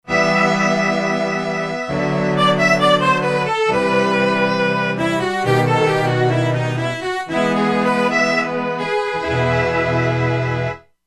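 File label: Room Of Strings, E-mu Proteus-2; Factory Presets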